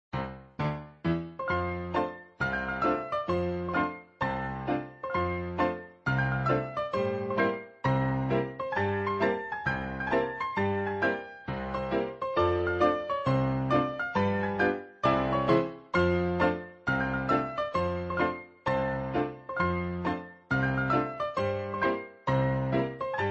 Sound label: Piano